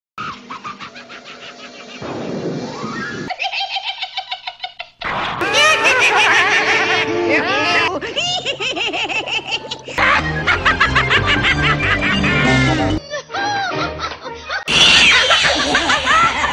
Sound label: witches, screampark